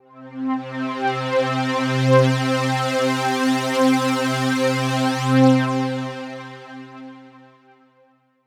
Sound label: PAD Apparition (C), Melodic One Shots; Cymatics; ANALOG EVOLUTION Beta